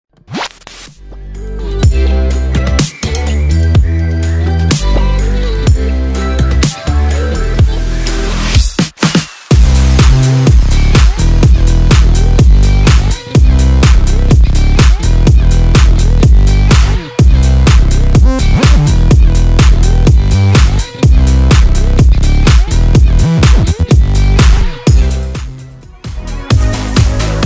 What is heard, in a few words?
cyber drumkit; cyber pop drumkit; siem spark